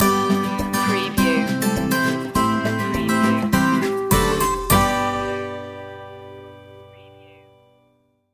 our happy place stinger

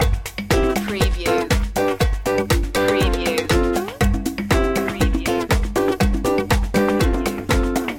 montuno loop

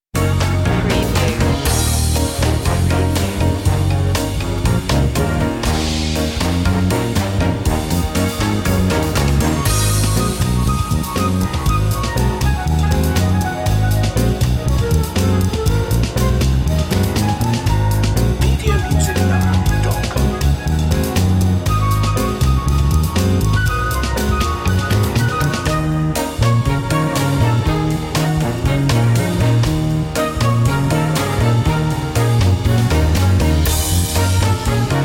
jazzy; media music now

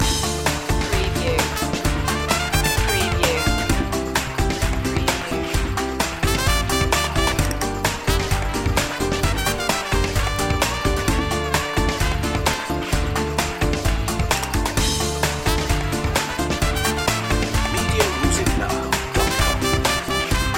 festejar loop

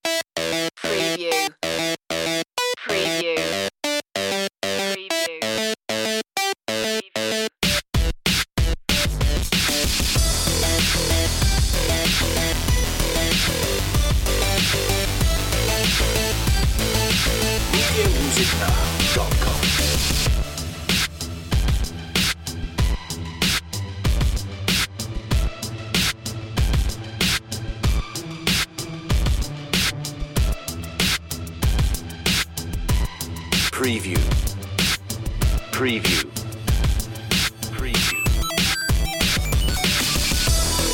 8-bit fat groove

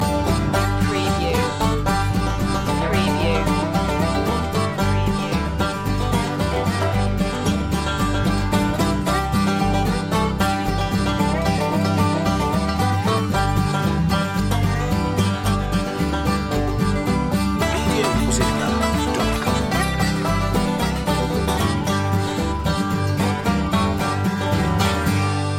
farmyard blues loop

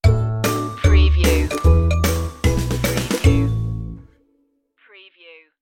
yip jump stinger

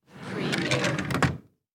Desk Drawer Close

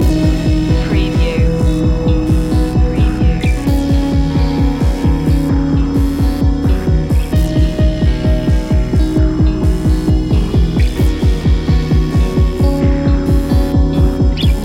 moving slowly loop

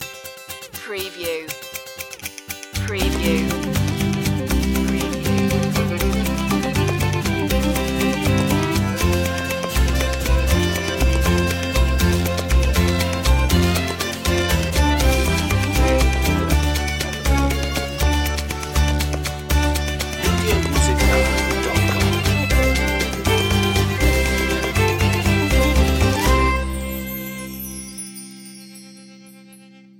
cartoon kit the happy west

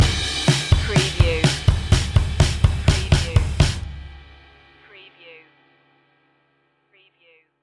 rock with us stinger

